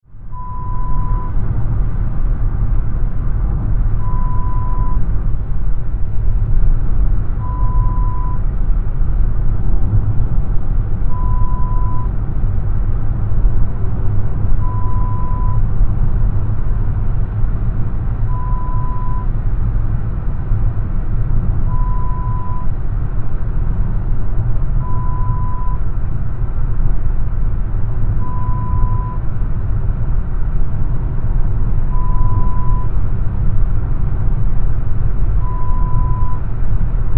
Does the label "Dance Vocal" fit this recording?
No